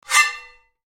mech sablya zadevaet skrebet

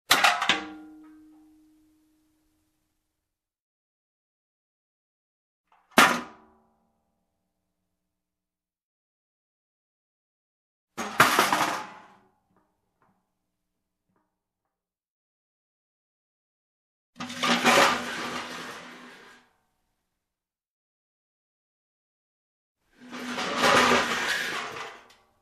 padenie metallicheskih predmetov

